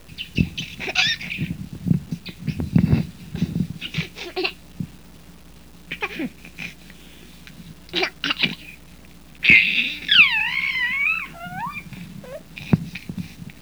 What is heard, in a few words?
BABY